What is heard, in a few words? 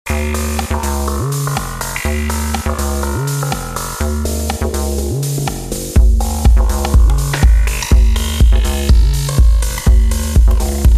tr-808